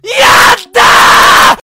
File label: YAAATTTAAA